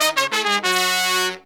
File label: Phantom Horns, 96BPM; 90 s Sample CDs; Zero-G